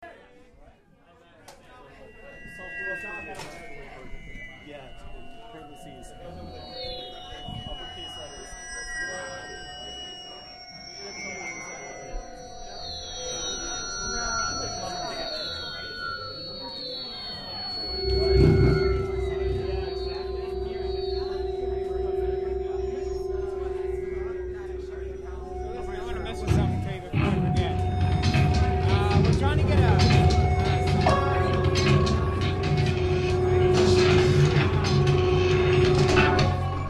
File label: bass